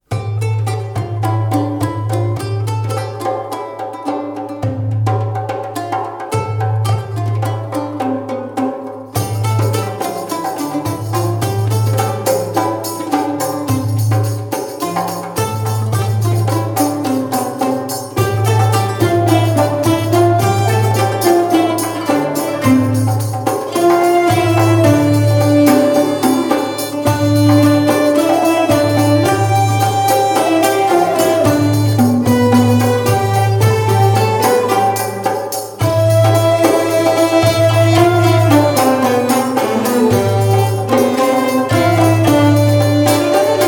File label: instrumental; Orientalische Musik